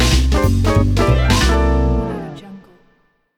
super jazzy winner

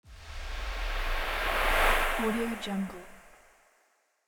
fast whoosh

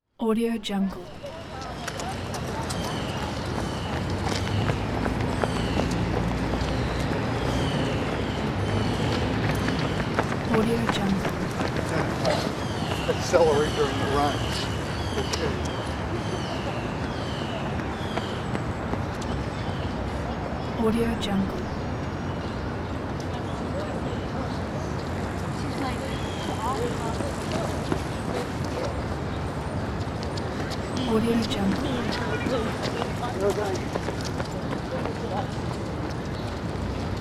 jogging

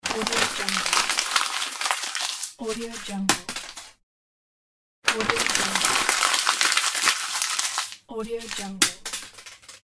crumple paper

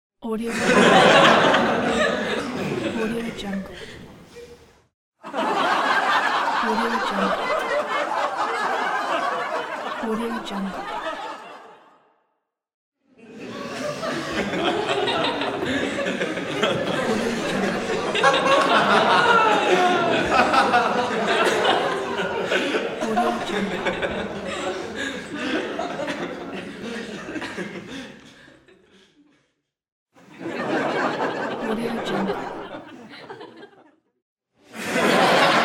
audience laughing

